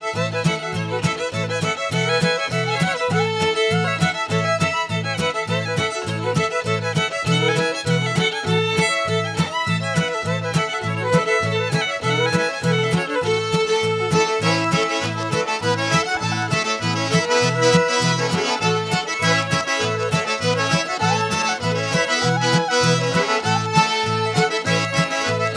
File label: creole stomp; loc-musicbox